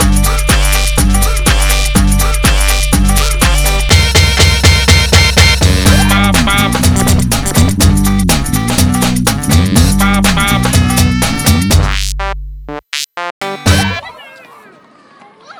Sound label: Funky; Funky children; STARR BEATS